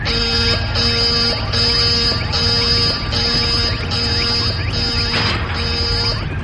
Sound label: beep